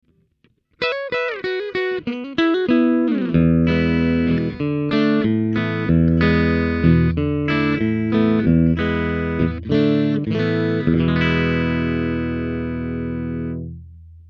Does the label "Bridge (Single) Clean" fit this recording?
Yes